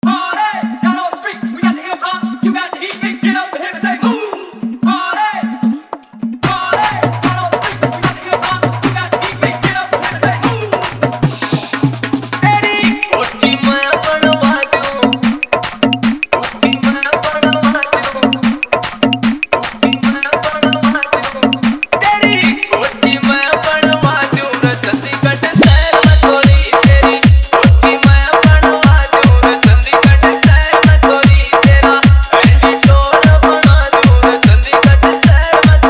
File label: Full Desi Bass Dance Mix